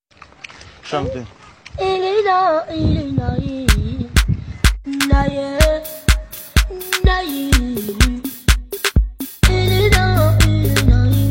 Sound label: Afro House Remix